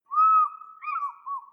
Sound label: distant bird sound; gamerip; caravan beast